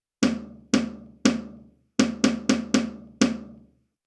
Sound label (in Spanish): Ritmo